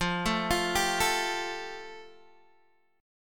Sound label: F Major Add 9th, Guitar Open D